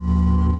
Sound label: Gate Fade In; SWARM